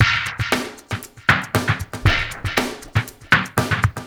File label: DRUMMY R; Spectrasonics; Liquid Grooves 1 & 2; 117 AQUAFUNK